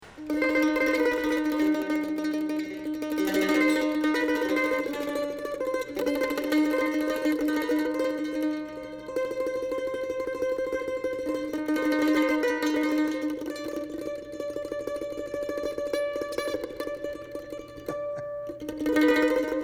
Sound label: mando solo